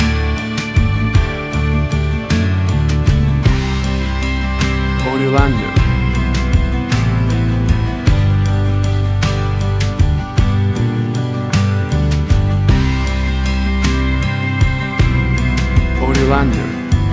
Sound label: Country Wedding Waltz